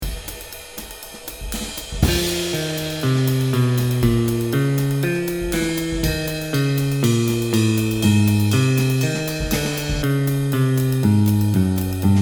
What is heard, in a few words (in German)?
Walking Bass